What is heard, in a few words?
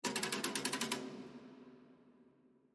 ambience room torture cage